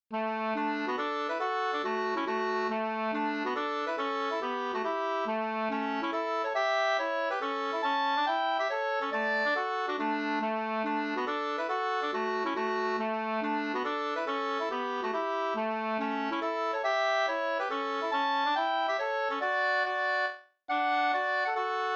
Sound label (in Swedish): hambopolska